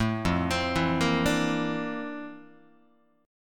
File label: F Major 13th Guitar; arpeggiated; JGuitar